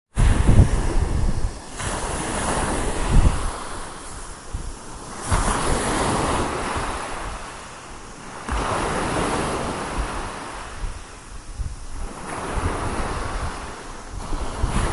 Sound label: beach at split apple rock